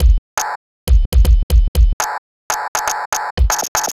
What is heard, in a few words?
Subtractive Beat